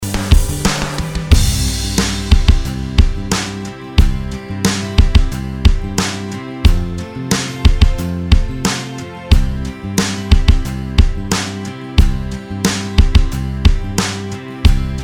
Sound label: guitar sample